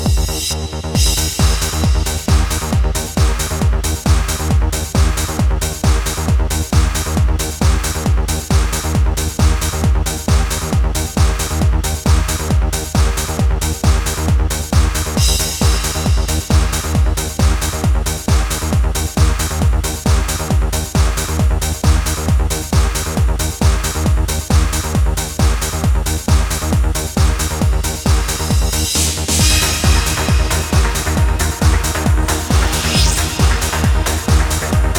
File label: Adagio for Strings Remix